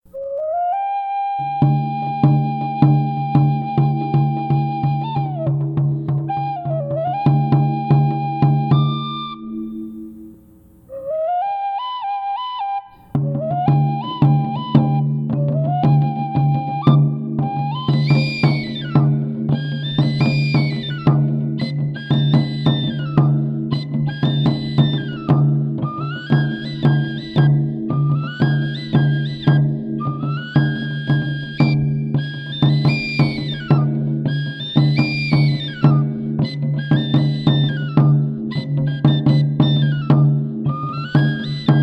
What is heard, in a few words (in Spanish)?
huaves caballo